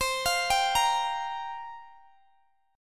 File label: C 7th Suspended 4th, Guitar, DADGAD